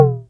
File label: SYNCUSSION